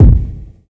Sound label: fluffy steel kick drum G sharp key